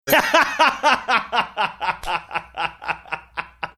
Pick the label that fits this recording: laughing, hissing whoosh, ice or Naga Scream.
laughing